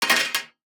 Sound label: DICE on Metal, Throw and Roll, Standard, Three Dice; Samples; ArticulatedSounds; DICE Sfx Party (Extended)